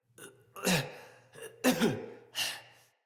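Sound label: Cough Walk, Barks; Battle; Envoy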